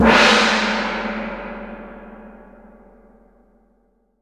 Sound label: GONG, GONG CYMBAL